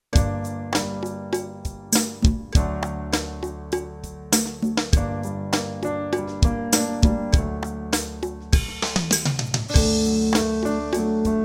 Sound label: Bb low Eb mid, brass practice